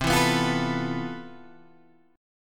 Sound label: C Major 13th, Guitar Open G, chord